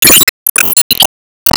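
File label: Sound Effect Ball Time Finish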